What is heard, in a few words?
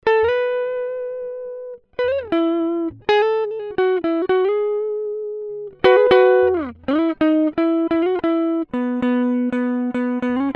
guitar; UFX